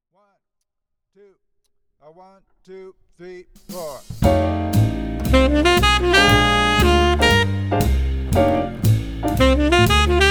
Triplets

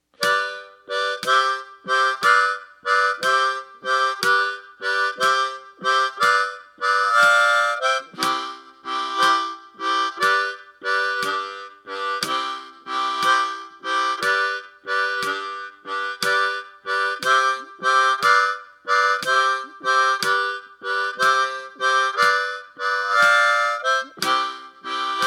Back Porch Blues

